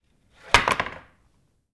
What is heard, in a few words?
wood chair falls backwards; prosoundfx